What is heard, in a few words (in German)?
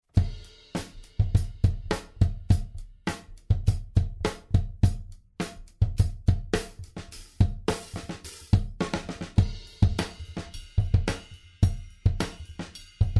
Schlagzeug